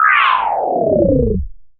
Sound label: SPIN DR DN, Anime Sound FX; COMIC